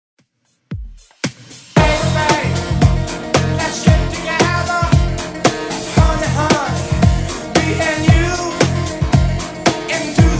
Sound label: 70s redrum 114 bpm